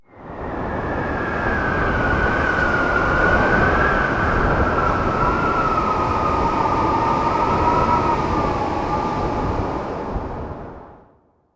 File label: archeology event wind ruins; ancient relics